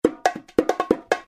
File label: bongo